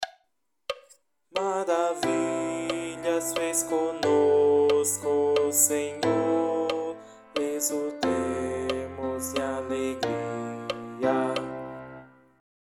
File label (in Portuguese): CORO